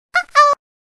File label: error